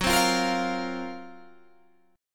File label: F sharp Major 7th Flat 5th F sharp, Guitar Open G; 16,17,15,15,18,15